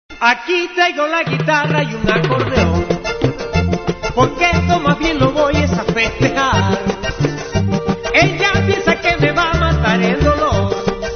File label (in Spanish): acordion